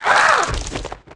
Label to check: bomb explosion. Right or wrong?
Wrong